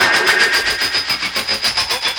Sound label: Ambi Groove